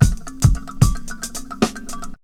HALF 112, loop, 100-114 BPM

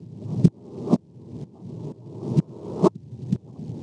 125 Atmosphere, Percussion (Mono); Loops; Touch Loops; ElementsOne; AbstractRhythms

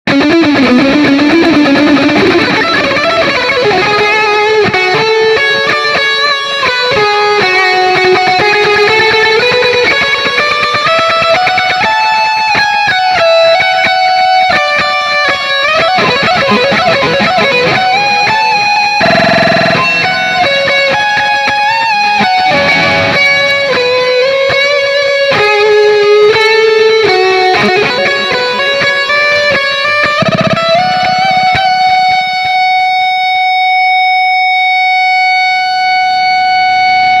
Guitar Solo

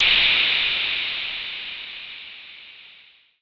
FX SHOT TEKNO 140; Techno2; Singleshots

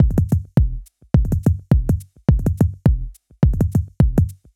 105 Loose Grooves, Percussion

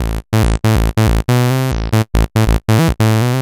TECHNO SYNTH 140; Keys

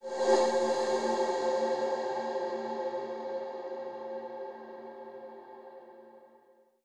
TECHNO FX 140

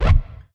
One Shot, Hits

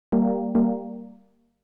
ORGAN 96 G; old school

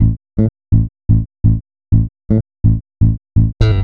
HOUSE BASS 125 A

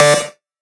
synth shot D; Electro; Anthemic; synth shots

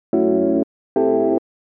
house synth 125 A, keys

